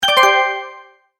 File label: multimedia tone alert; Tones; Sound Design